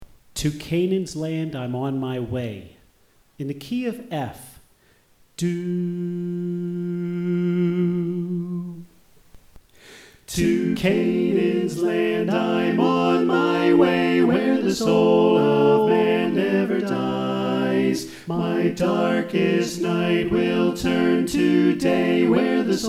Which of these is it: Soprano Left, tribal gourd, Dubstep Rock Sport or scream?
Soprano Left